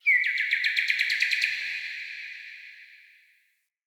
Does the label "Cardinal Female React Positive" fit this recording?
Yes